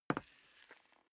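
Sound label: step boots wood parquet slide long, footsteps; menschen; schritte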